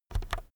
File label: keyboard external key press fast soft